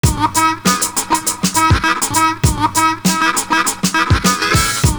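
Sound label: HARMONICAL, 100 bpm; Univers Sons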